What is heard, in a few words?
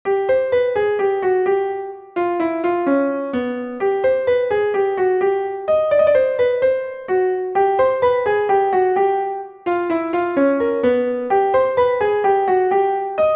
Desert Piano Mix